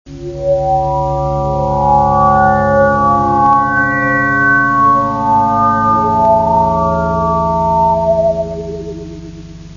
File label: bellish, poly